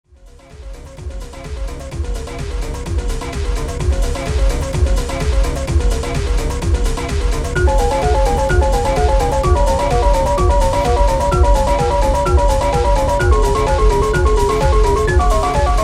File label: Night rain, hard trance; J.S.Bach conceptual hard trance